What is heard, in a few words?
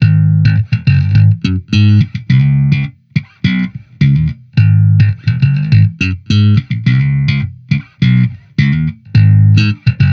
Amped loop Stand Up 105 Abm, Electric Bass Loops; Slate Digital BASSHOLE Sample Pack